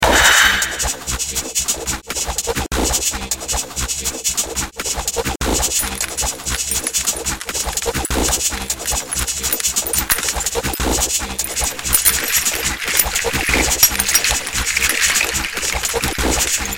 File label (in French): melodie compo